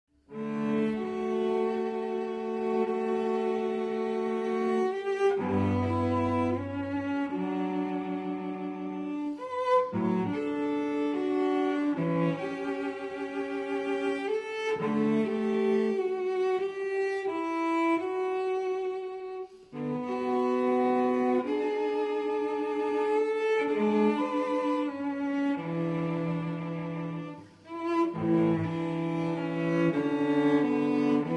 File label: Cello Suite in D Major Sarabande; Cello Suite No. 6 in D Major, BWV 1012; IV. Sarabande